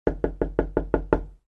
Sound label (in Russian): stuk v dver